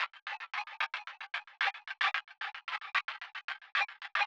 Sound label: a phone loop